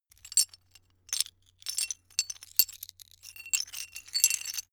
metal pieces clatter

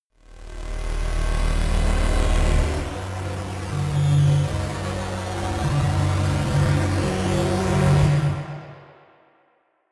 bass double swell, Digital; atmosphaeren umwelt

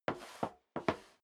step sneaker metal scuff; schritte

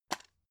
drug package drop soft; arzt

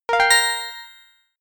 feedback positive, signale

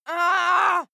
female fight pain growl

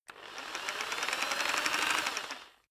rock drill hammer rev long soft; maschinen; bohrer; soundpool

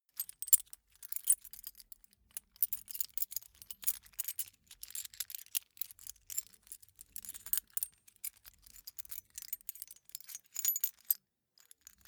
key ring mid size rattle sequence soft; objekte; schluessel

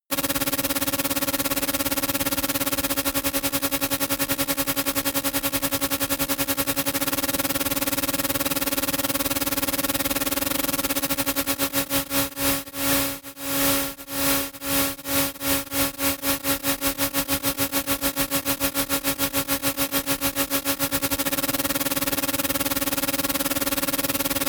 sci-fi scanner; energie

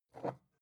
glass pick up smooth soft; objekte

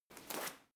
medicine ball throw; sport freizeit; fitnessstudio

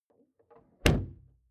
car door close exterior hummer h3; Cars

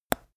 teeth clench; koerpergeraeusche; menschen; soundpool

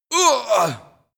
male death short; schreie; menschen; soundpool

